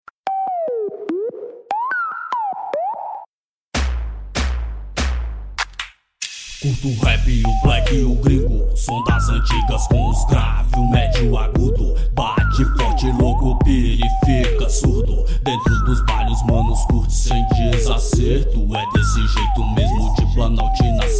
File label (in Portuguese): rap remix